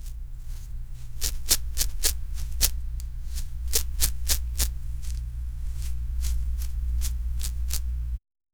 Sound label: a lasso rope spinning; agricultural